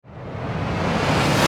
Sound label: Power Up Reverse Suction Build; Rise And Dive; Blastwave